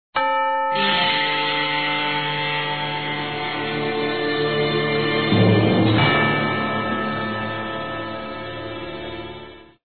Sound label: lose Music; spongebob squarepants invasion of the patty snatchers